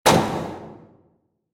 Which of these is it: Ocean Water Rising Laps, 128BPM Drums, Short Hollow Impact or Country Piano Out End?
Short Hollow Impact